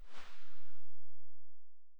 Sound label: sa58 silencer very far, weapons; STALKER; IWP